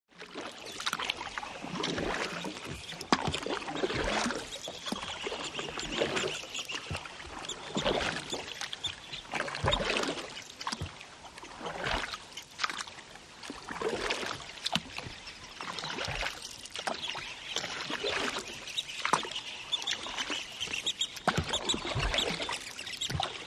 BOATS & SHIPS CANOE: Paddling, plovers, Zimbabwe. Zambezi atmosphere. Canoeing.